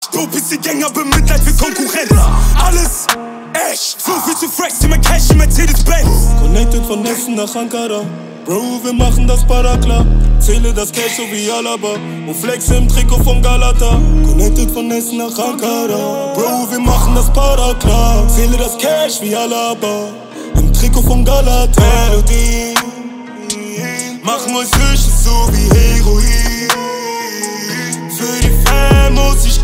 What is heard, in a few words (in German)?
MELODIEN